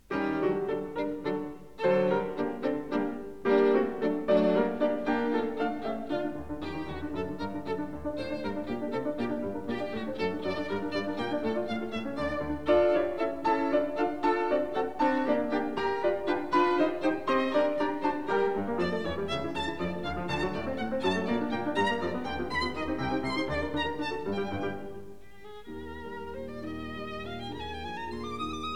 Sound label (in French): Sonata No.10 en sol majeur op 96. III Scherzo. Allegro, Piano and Violin; Beethoven; Chamber Music; Complete Sonatas for Piano and Violin; Franscescatti